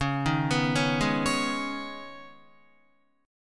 Db 7th Suspended 2nd Db, down, Guitar; Db7sus2 arpeggiated; tuning=4E,4A,5D,5G,5C,6F

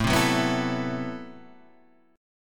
A Minor 6th Add 9th, Guitar, Standard; Am6add9 strummed; JGuitar

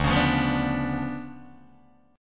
D Minor Major 13th, down, Guitar, DADGAD; DmM13; strummed

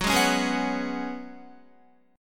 Gb Suspended 2nd Suspended 4th, Guitar; strummed

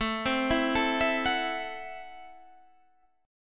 C 6th Flat 5th A

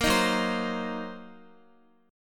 Gb 6th Flat 5th Gb down Guitar; strummed; 4E,4A,5D,5G,5C,6F

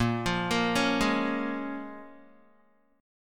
Bb Minor 7th, Guitar, Bbm7 chord; Bbm7 arpeggiated

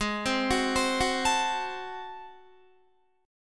G sharp 7th Flat 9th G sharp down Guitar, chord; G#7b9; arpeggiated

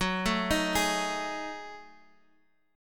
Gb Minor 6th Add 9th, Guitar; Gbm6add9; arpeggiated; 13,12,15,13,x,x; 4F,4A,5C,5G,5C,6E

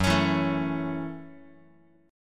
Fsharp Minor 7th Fsharp, Guitar; strummed; x,2,4,2,1,x; 4A,4E,5A,5D,5G#,6C#

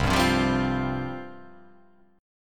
C Suspended 4th C

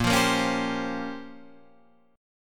Bb Minor 6th Add 9th Bb down Guitar Standard; strummed; JGuitar

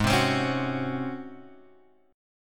G sharp 11th, chord; G#11 Chord {4 3 X 5 2 2} strummed

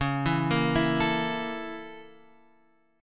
Bb Minor Major 7th Flat 5th

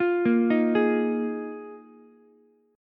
D sharp Suspended 2nd Suspended 4th, Ukulele; arpeggiated; JGuitar

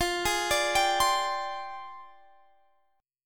F Minor 6th Add 9th, Guitar, Standard; Fm6add9 arpeggiated; x,20,18,19,20,20; JGuitar

